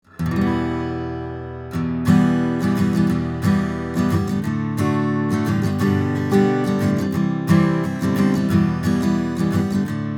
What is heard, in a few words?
AGit 30cm; Beyerdynamic M 90 Pro X